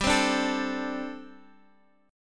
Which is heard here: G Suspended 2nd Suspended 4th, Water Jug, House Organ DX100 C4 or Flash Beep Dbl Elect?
G Suspended 2nd Suspended 4th